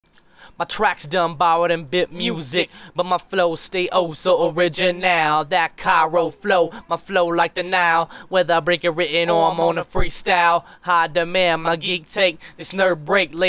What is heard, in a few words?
acapella